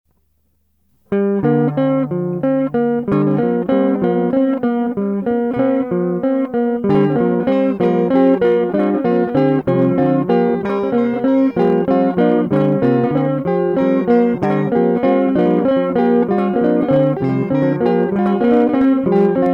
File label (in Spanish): GUITARRAS - GUITARRA; NOVA